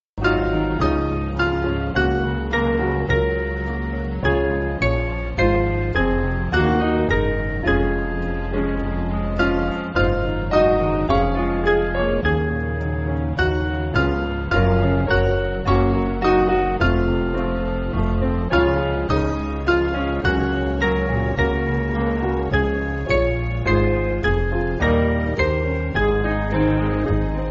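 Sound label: Piano, Music Backing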